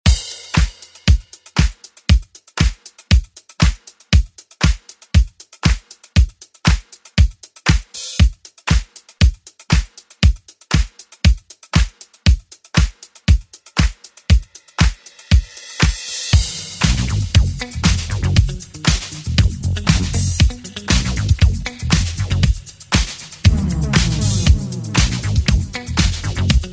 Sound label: 80s ReDrum; DJ Mhark